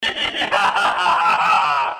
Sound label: moon creepy; copycats; Panda Clones Jumpscares